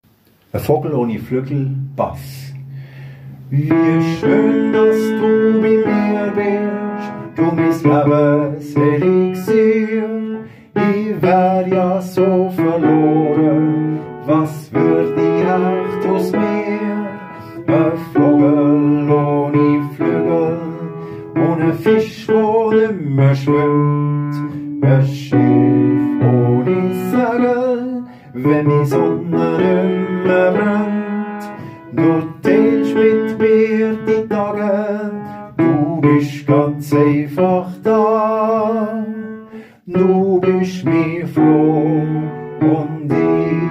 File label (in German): E Bass